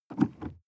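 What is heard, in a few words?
sports car interior pedal gas step and release; autos; verkehr transport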